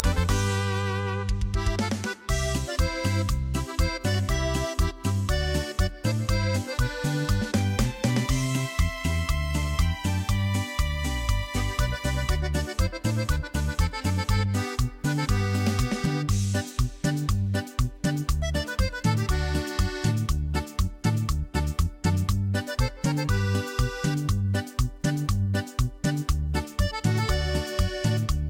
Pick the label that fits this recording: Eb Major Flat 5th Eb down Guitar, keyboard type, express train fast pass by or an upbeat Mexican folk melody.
an upbeat Mexican folk melody